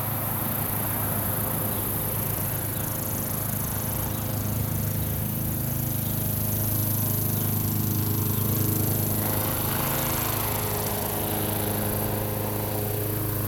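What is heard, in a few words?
exterior, ambience; Counter Strike Model Pack; CS:GO; inferno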